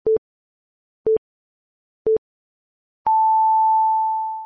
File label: sine